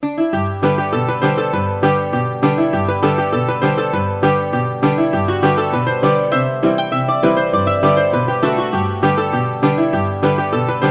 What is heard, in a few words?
Mandocello; Banjodoline; Big Sciota; The Earle of Salisbury; William Byrde